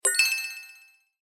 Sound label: win Last; 1casino